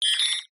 science fiction robot android beep chirp classic

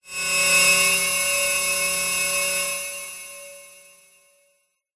cartoon anime electric metallic grinding whining

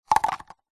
household cups plastic drop onto others; zapsplat

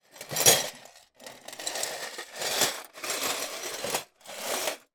foley glass broken movements in metal tin; zapsplat

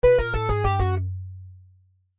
game sound synth tone bold fail

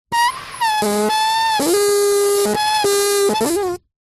foley balloon air release slow squeak

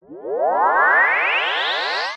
ascend large chord ripple magic shimmer; skyclad sound

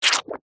cartoon squelch

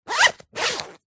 foley luggage rucksack backpack zipper fast; zapsplat

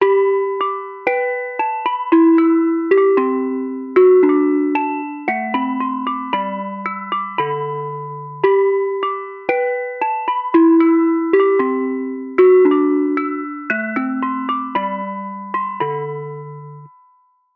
musical ice cream van musical chime; zapsplat

